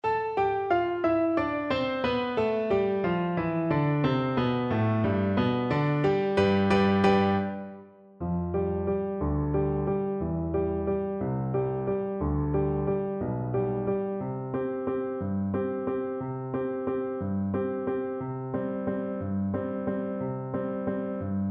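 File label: lake, marimba; Percussion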